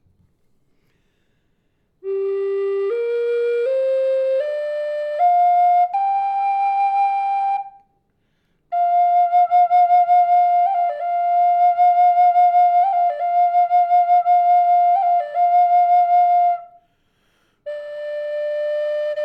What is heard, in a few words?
natural, Flute